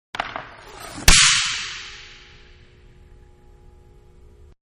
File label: Ice Hockey Face off; Hockey Ice-Face Off; Sports